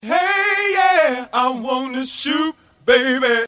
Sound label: shoop